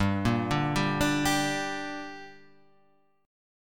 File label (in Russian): Perebor Gm